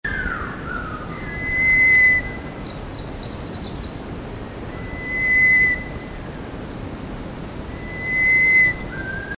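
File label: hookbilled vanga, Madagascar; Madagascar - 20th Oktober to 16th November 2003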